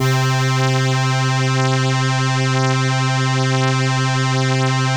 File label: ELKA, String Machines; ELKA RHAP